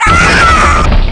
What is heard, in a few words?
DEATH; QUAKE2; megaman